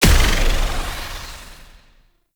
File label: Shield Ability Burst; Warframe; Warframes; Helminth Abilities